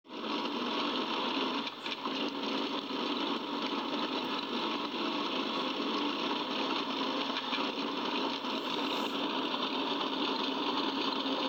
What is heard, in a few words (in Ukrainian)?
zvuk opishnyanskogo goncharnogo kruga